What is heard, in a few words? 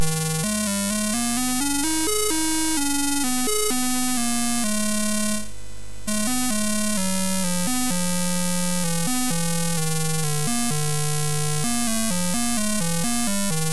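Mountain King, MOS 6581 SID chip music, games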